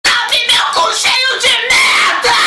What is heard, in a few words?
yaaas